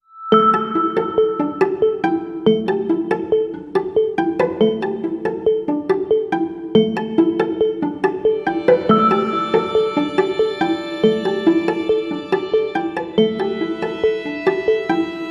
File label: Sky Gallery No Perc